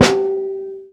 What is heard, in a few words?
Snare Squash, drums; One Shots; Oddities